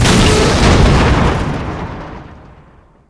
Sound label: explode; aw50